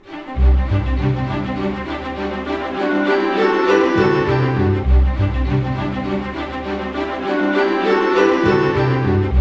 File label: BIKE; East West; Scoring Tools; ORC; Bike Ride C